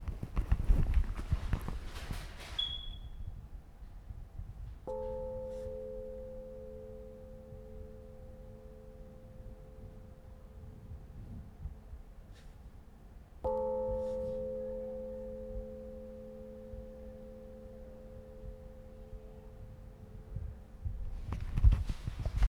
industrial fog bell; South India street recordings

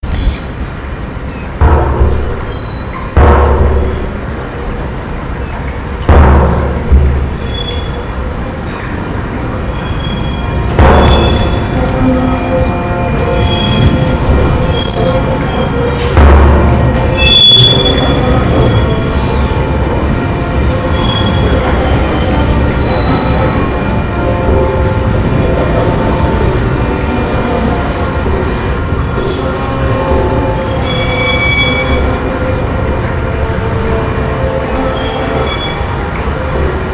soundscape; Port Chalmers

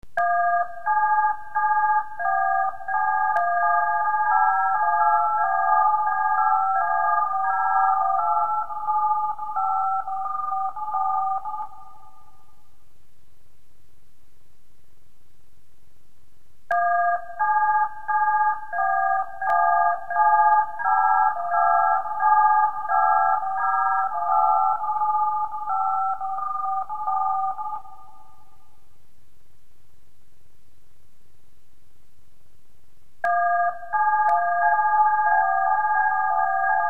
electronic beep noise slow loop

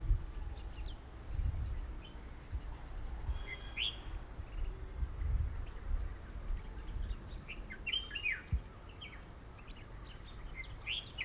bird tune loop, South India; South India street recordings

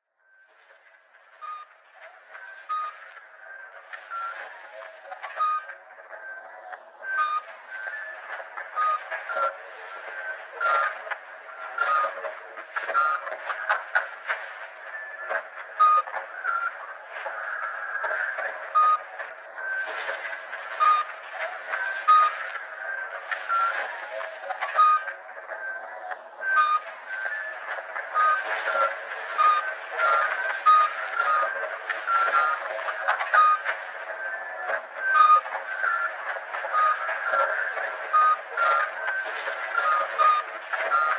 super market beep

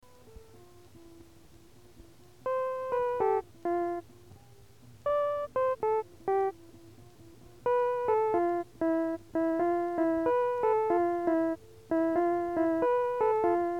Untitled Piano

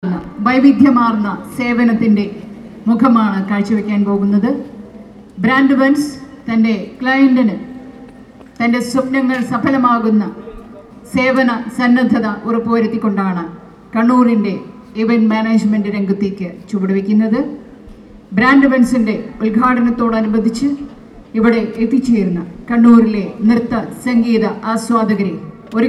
sufi dance, South India street recordings

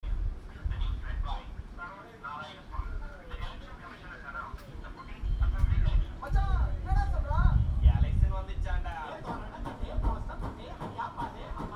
singapore radio in street

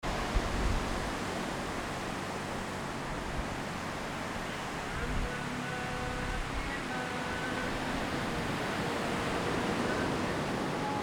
rain mosque street, Indonesia

